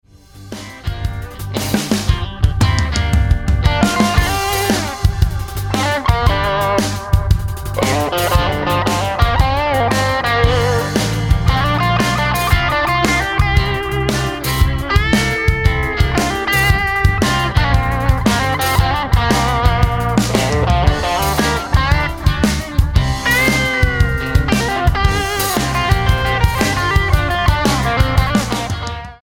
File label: guitar solo